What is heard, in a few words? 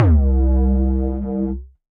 Bass; Detonate Bass; Vibrations by ModeAudio